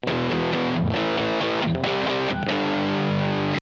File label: bad rock, guitars